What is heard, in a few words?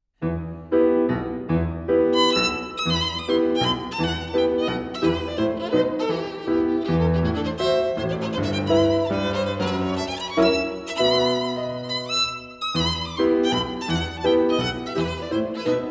Mazurka, violin and piano; Elgar